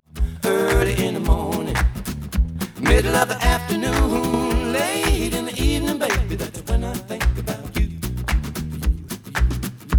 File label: noised; 4bit